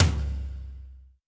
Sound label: big tome drum sample c sharp key